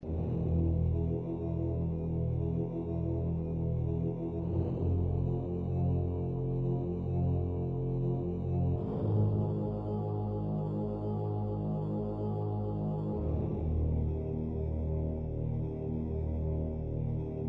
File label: spooky mystery